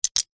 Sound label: lego bricks x4 set down